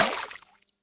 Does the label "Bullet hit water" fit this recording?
Yes